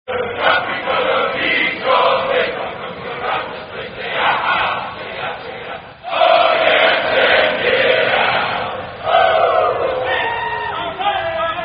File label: Army WOOOO, Running Cadence; Jody